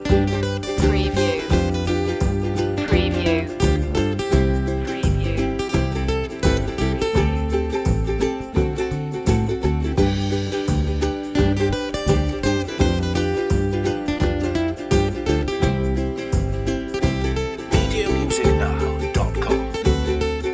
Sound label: carefree walking loop